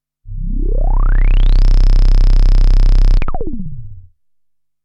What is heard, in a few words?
Behringer K2 Filter Sweep